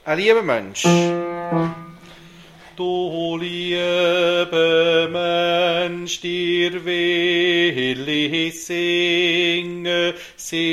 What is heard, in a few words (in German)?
1. Bass